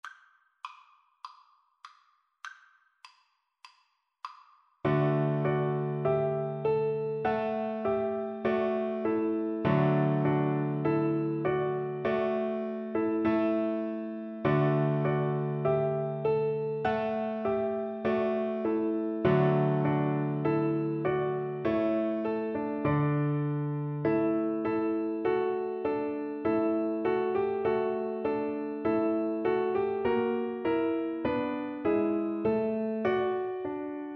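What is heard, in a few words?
VLC karaoke, Cello; beethoven; ode to joy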